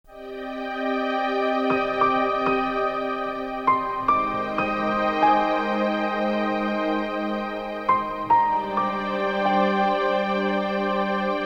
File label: Winter Transition, music